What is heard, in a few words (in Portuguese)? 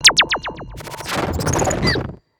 Sfx - Morte E Transicao De Tela; Neon Souls; PS4 PS5 switch windows xbox one xbox series xs